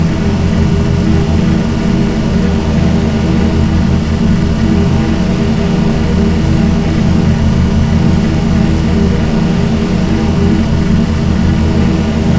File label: spin